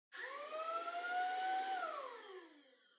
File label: Vacuum Cleaner Far Vacuum Low Short